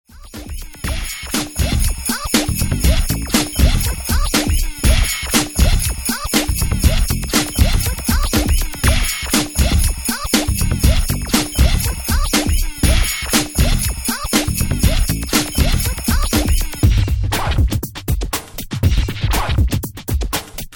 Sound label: scratch and pop it